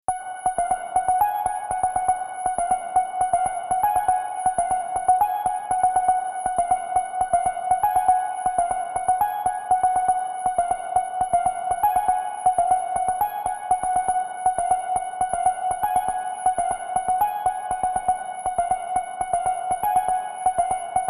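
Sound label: VSTi MIDI only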